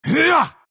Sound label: attack big; pangolin